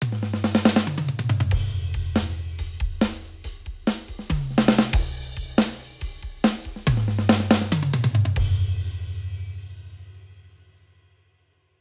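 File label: Modern Country Comp Full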